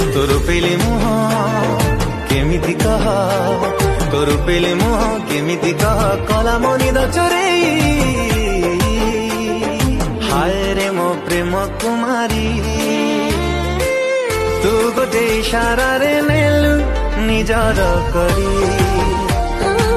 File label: Odia Song Ringtone